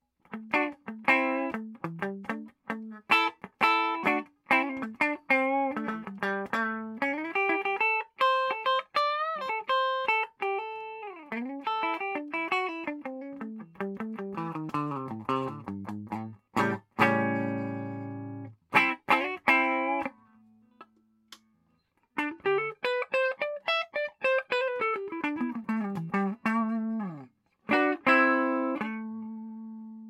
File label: CLN, preamp